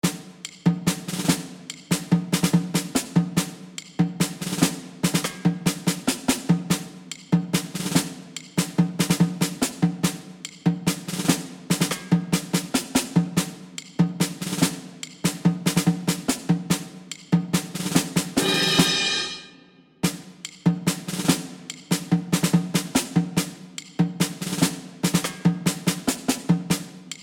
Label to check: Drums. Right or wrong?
Right